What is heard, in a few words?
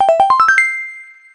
open; smbx2; SMBX Level Player